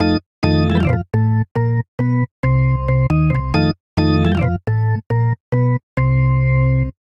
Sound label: Phrase Boogaloo Organ F7 136 bpm; Electric Keyboards; Ableton; Factory Packs